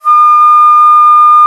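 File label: alto bansuri, World Winds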